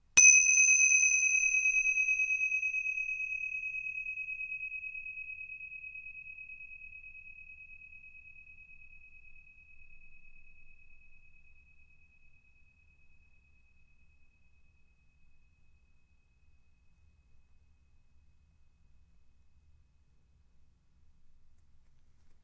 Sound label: tingsha